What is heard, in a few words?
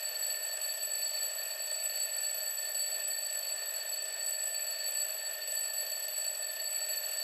Clock Alarm Far Mid Loop